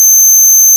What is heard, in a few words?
moog, constant filter